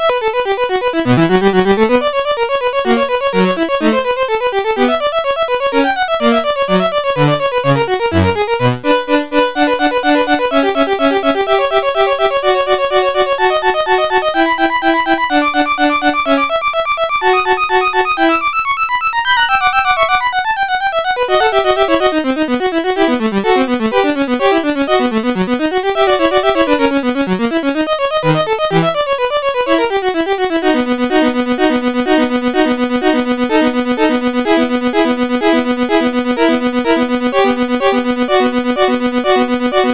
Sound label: Viola Duet, CSG8580R5; sid